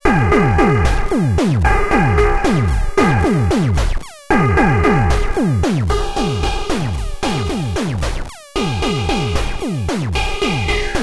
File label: Loops And Drones